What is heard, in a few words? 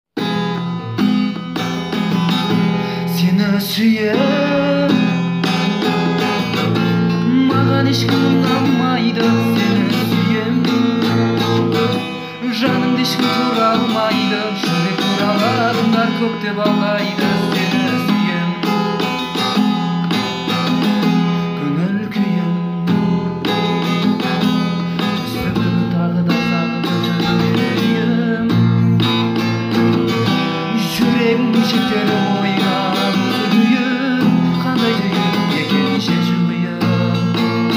gitara kaver